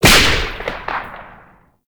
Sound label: Whip Crack; Oraxia Whip